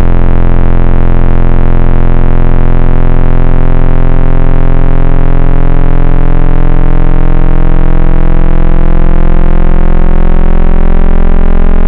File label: Squary; Synthetic's Serum Collection; BVKER - Synthwave